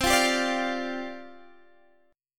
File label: C Suspended 2nd Suspended 4th C Guitar Standard; strummed; JGuitar